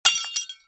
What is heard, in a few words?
crash; TamTamMicro.activity